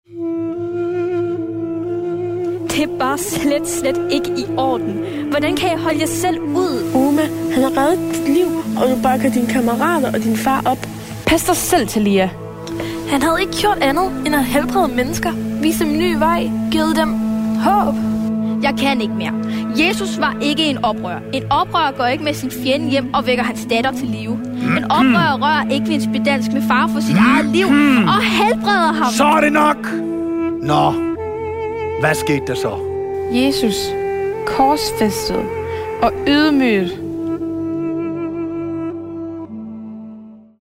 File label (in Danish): Drama musik og drama